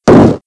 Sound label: Shockwave Rumble; Playable Tutorial Level